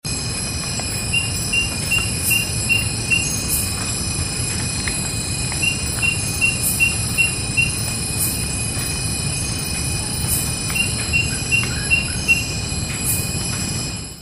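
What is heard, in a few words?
nyctixalus pictus, Spotted Tree Frog call; Sime Forest, Singapore, 07 Mar 2017, 2250 hrs.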